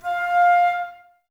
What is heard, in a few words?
Flute, Woodwind